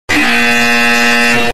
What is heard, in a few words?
loud buzzer slowed